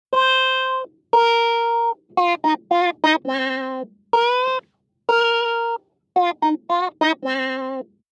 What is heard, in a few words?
spring dry